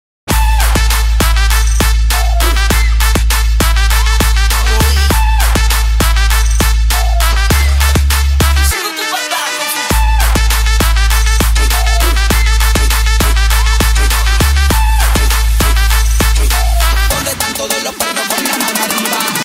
CHA CHA CHA ring

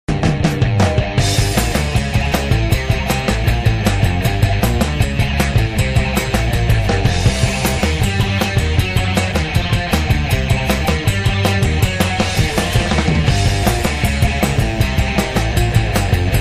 melody rock, ringtone

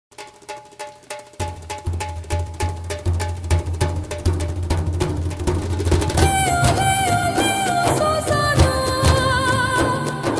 cute humming

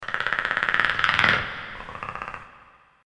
Snarl; Predator

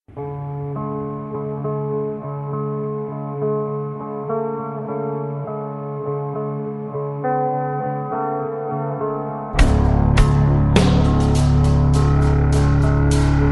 Slow Rock

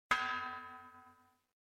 TradeOpen; Guild Wars

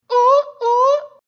Party Notification

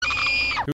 Duck screams

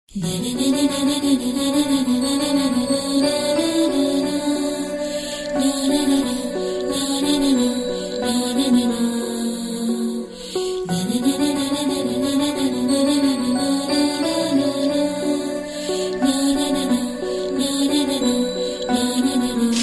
arab love, ringtones

